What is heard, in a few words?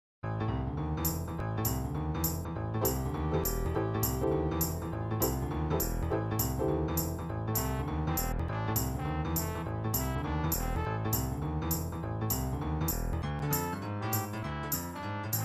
mud slide